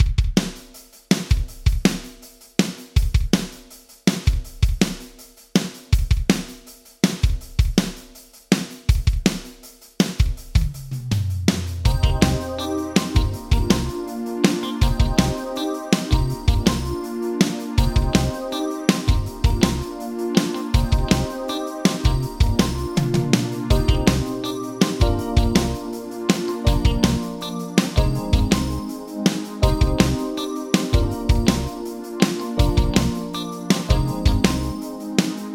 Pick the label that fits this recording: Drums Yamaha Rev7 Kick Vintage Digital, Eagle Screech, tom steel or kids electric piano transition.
Drums Yamaha Rev7 Kick Vintage Digital